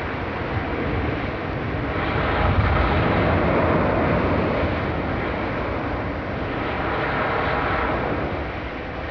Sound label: heavy surf